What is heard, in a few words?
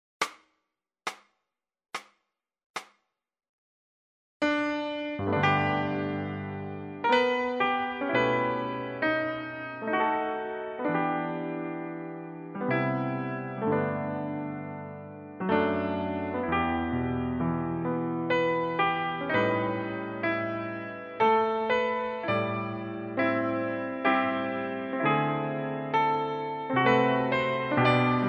Amazing grace play piano solo